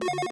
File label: MOVE; C64 Dreams; Multi-Sound Default